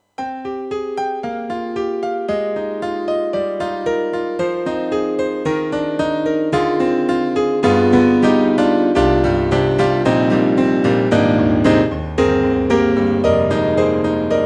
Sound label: YAMAHA MU500 Brit Pno